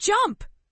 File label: jump